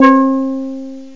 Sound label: Steeldrum; 707